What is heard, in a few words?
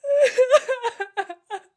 cry female, Voice Human